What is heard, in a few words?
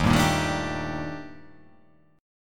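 E flat Major Sharp 11th; strummed; chord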